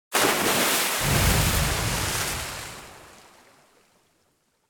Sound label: bomb material water; War Thunder